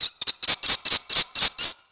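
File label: ambience bird; Tony Hawk's Project 8; XBOX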